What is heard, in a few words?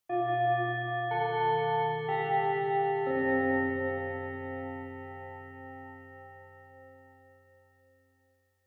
Japanese School Bell Far Slow Short